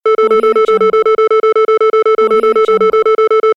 device alarm

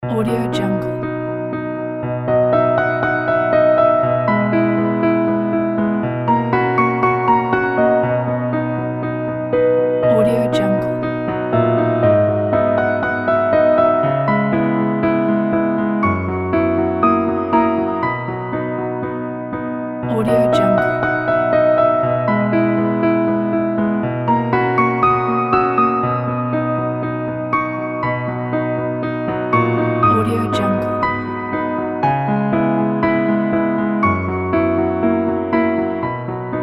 romantic piano

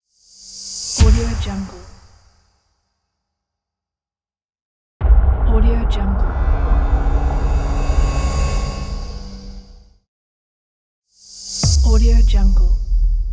cinematic impacts

